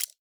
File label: cluck